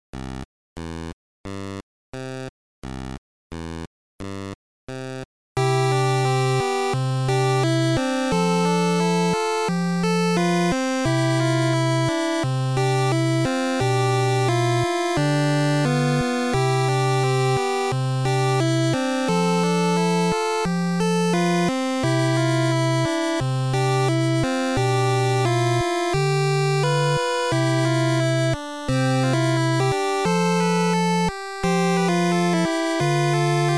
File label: instrumental